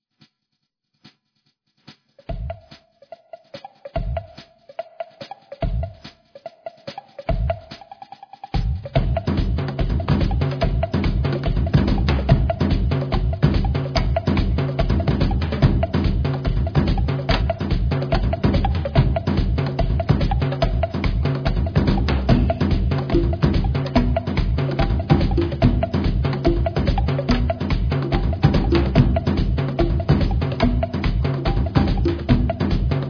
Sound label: fire